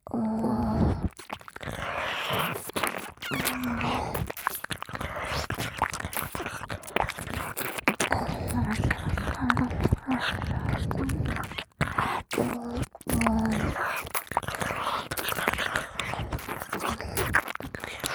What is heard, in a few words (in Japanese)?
激しい耳舐め 両耳